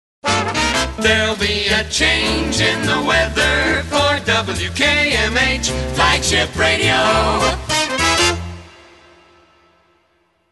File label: Radio Jingles; WKMH